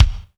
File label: MIX BD, AKAI S6000, DRUM RYTHM; AKAI S6000 CD-ROM - Volume 1